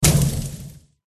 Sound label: dark prince attack contact bottom